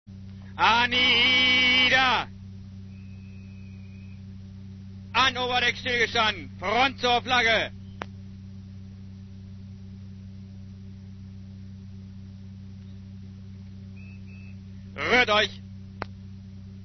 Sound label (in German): Flaggenparade